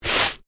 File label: MALE SNIFF SHORT; Sick Sound Effects; Human male sniffing; Sick (Sound Effects:Human)